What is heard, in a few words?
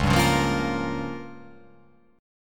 Db 6th Flat 5th Db down; Db6b5 strummed; JGuitar